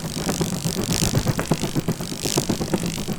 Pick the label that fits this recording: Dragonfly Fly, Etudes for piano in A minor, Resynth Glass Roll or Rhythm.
Dragonfly Fly